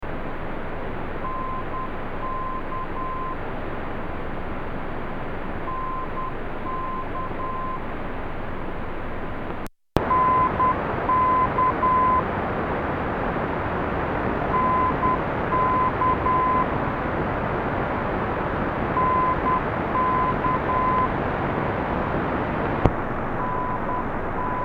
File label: 489KHZ, NDB Beacons; Poznan Krzesiny; received in Wrzesnia